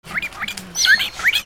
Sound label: BIRDS